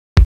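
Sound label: BASS DRUM; FAIRLIGHT CMI IIX; DRUMS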